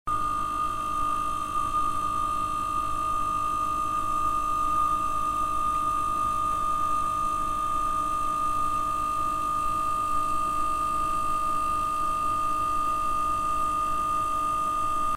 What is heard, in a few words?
Pulsating High pitch Sound